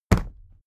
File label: briefcase plastic put down hard; industrie wirtschaft; bueroarbeit